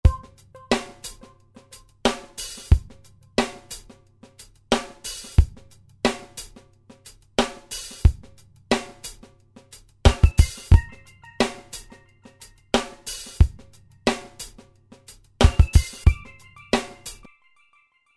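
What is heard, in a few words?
Ambient Groove Bass 90; Roland SR-JV80-10 Bass & Drums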